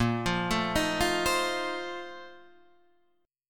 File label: Bb Major 7th Suspended 2nd Suspended 4th, Guitar, Standard; 6,8,7,8,6,8; arpeggiated